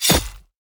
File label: dragons enemy lava evolved skyfall; sfx